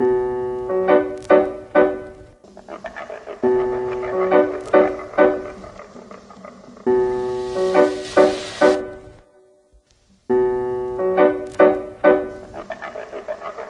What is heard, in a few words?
loop 140 BPM D